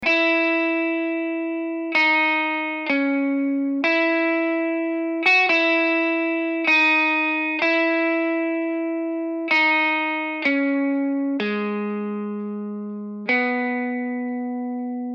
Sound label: stock audio 127bpm